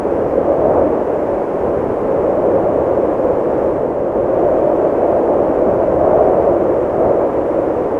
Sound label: Ambient mine; Ambient mine01; Xbox 360; gamerip; dungeons dragons daggerdale; xbla